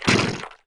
STINKBUG WAR DAMAGE

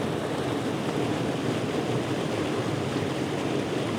Waterfall, ambience

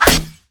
throw metal, Damage

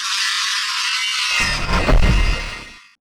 DIE

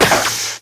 gun Scale, Damage

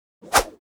knife, Weapon

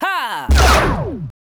Turncoat Sniper Attack

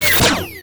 WAR ATTACK

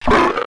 SNATCHER BAIT damage

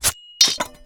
Throw Skin, Damage

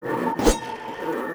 BLADE PAWN WAR ATTACK

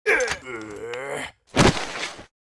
VAFERREX DIE

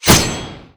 Sword Metal, Damage

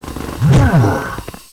STONE BLOCK WAR ATTACK

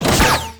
unit damage

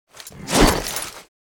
WAR ATTACK; HUMBABA; Mob

